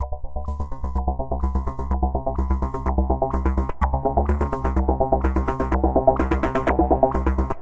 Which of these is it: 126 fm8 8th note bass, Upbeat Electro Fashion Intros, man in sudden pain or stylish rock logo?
126 fm8 8th note bass